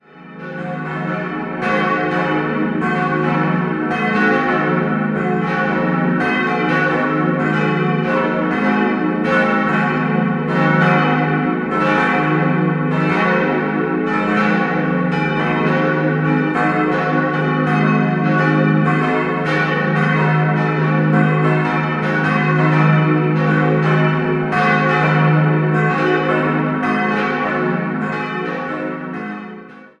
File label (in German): Bad Wiessee, Glocken